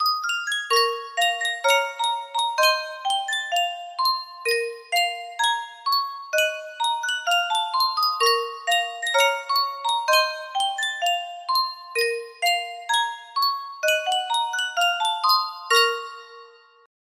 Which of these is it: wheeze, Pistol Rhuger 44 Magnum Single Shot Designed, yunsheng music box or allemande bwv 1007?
yunsheng music box